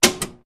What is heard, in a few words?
Switches Industrial